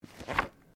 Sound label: Book Page Gloss Turn; Book movement; Books (Sound Effects:Offices)